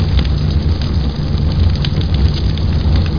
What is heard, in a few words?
Fire-Loop; SpecOps2 MPlayer Demo